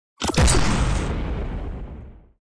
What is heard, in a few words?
Sci-fi weapon neutron cannon hitting